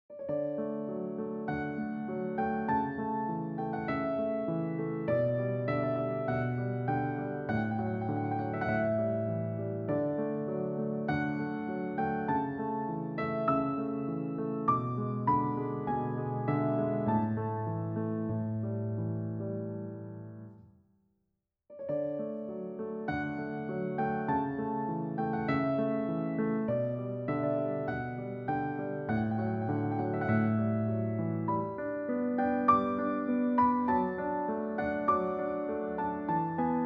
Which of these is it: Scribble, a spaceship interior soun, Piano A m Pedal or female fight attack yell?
Piano A m Pedal